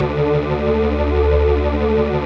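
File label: dance organ D2; Best Service; Advanced Media Trax Modern Composer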